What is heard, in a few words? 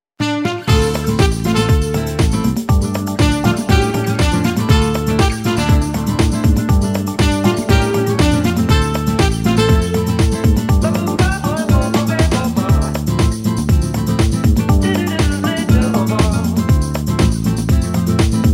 TUNING JAZZY